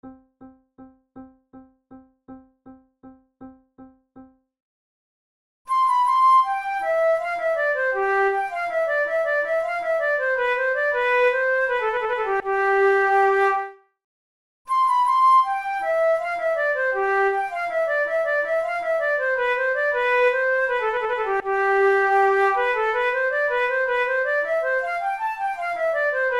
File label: menuets; Naudot; 6 babioles